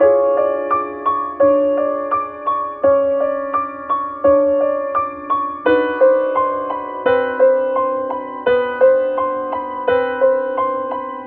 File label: piano, Loops Sad